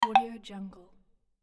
woodblock notification

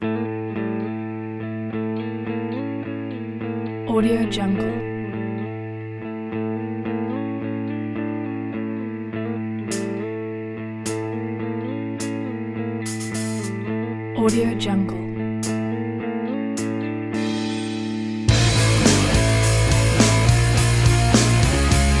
tension

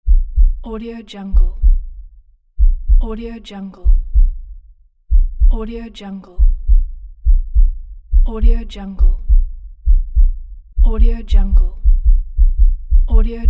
heartbeat

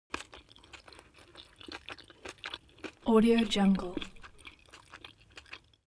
human mouth chew spaghetti medium; smartsoundfx

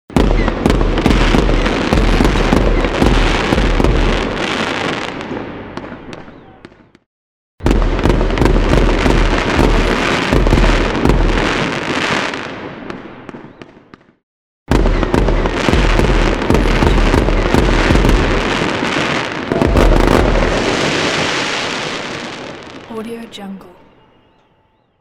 fireworks

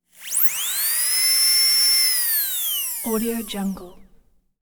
dentists drill; prosoundfx

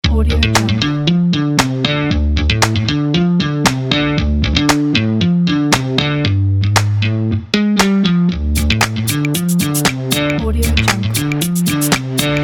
funk

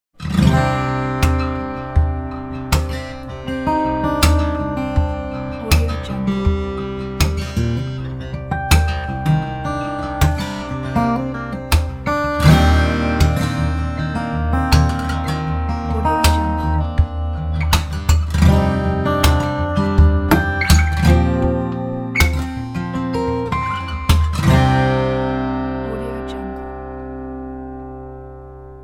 hypnotic healing

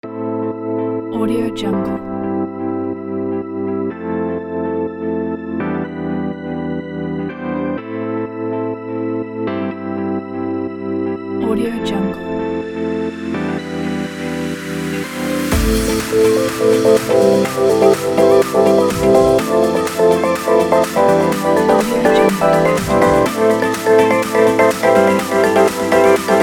luxury fashion house

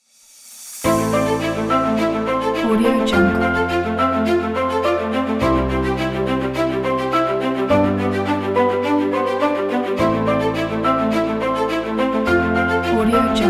the epic kit

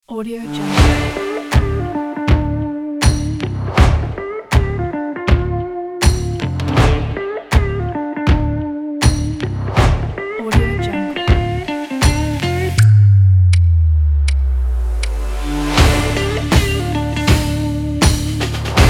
sport rock intro; yellowspeaker

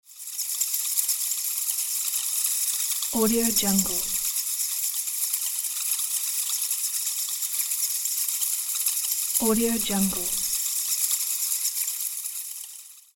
bats flying sound

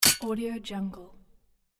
mouse trap

